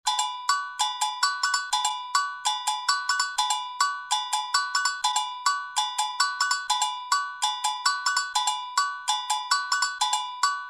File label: agogo